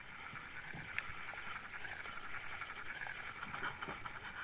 spindle, Fishing

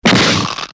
hit

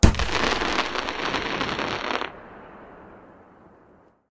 boom, firework

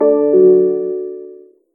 bmw, belt, speedo

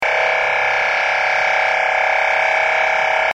horn, siren

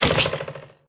start

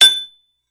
hit; Ammo

